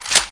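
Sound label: bolt release, ak; weapons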